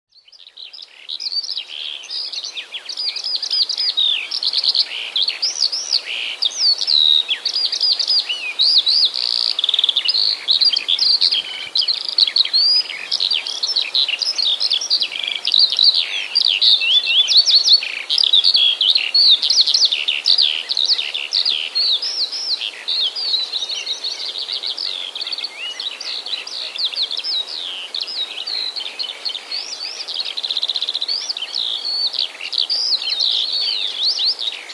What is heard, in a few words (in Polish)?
skowronki polne